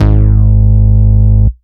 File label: Bass, Root G#; Vengeance Samples